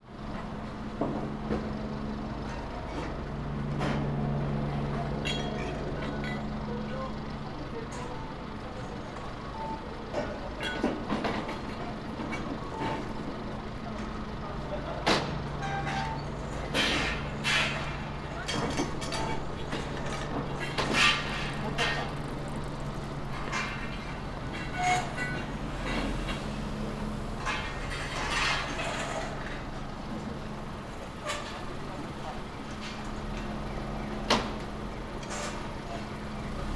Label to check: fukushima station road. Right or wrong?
Right